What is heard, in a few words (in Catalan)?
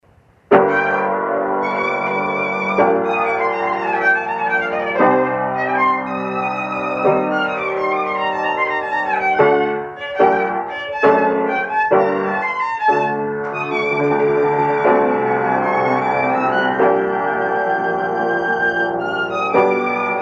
piano i violí